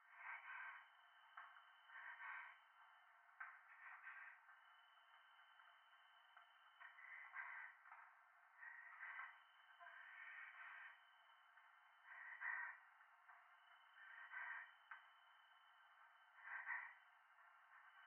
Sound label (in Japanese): トイレ 左耳密着吐息 山奥